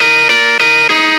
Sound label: guitar, Phonky Loops